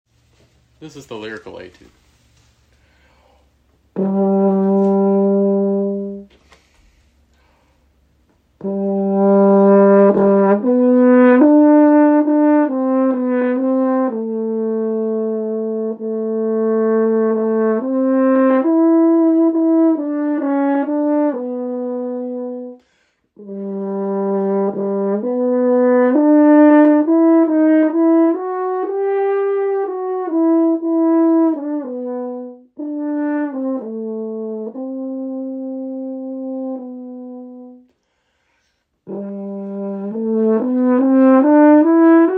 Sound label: Lyrical Etude; madison bison band